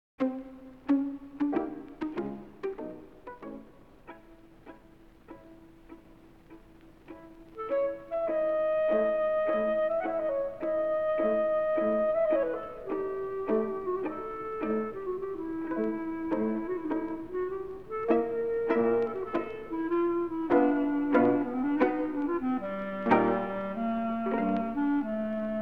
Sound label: Verbunkos (Recruiting Dance); Contrasts - I